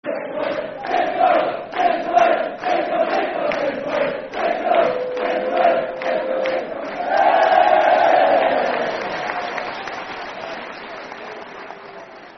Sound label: Football Chant (Soccer Song); Give Us A Wave; Stockport County Scfc